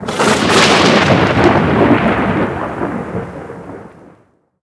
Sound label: Anvil Rain Thunder; Skylanders Cloud Patrol; Arcade, iOS, Mobile; MagicItem